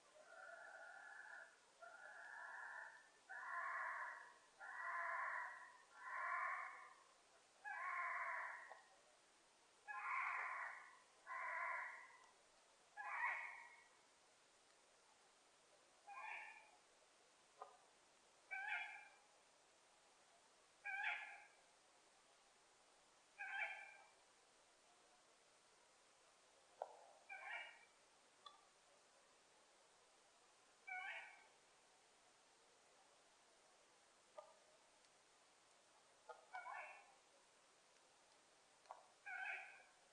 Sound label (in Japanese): fukurou